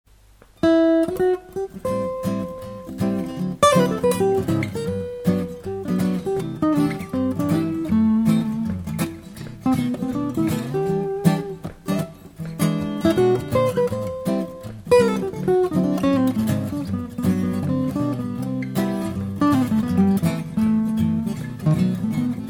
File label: 2 guitars overdub 50cm; samson c01u